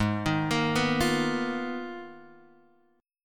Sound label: G sharp Minor 6th Add 9th, Guitar Open G